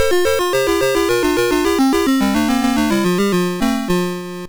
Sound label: Quari, MOS6581R2